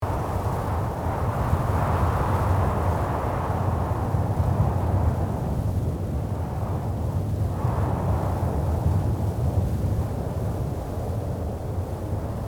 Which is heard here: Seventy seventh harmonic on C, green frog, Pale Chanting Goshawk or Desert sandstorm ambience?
Desert sandstorm ambience